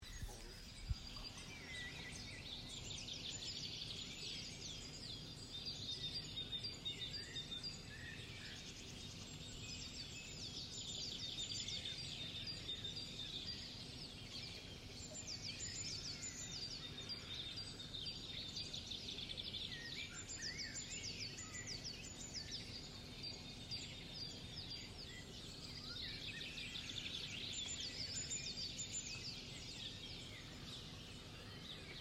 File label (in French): Oiseaux du matin